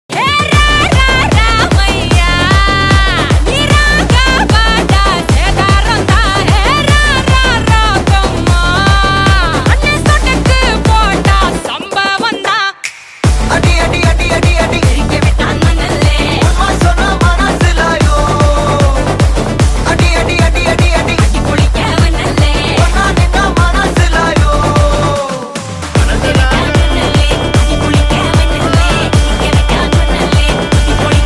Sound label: song ringtone tamil music song